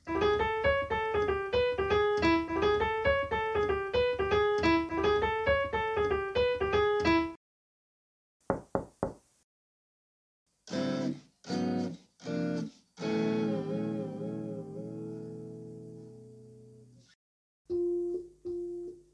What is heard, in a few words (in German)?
Klingelton